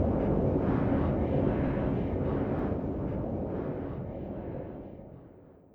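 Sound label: Booster Interior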